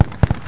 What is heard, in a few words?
in hole